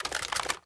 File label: reload mvt; cstrike; valorant; reaver vandal